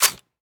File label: lr300 bolt catch; Rust